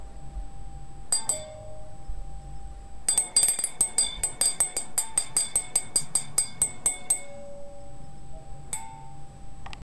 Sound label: ceramic kiln opening soun